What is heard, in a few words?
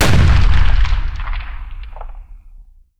explosion; rocket/explosion; fps project